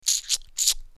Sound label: Reload; Assemblee SFX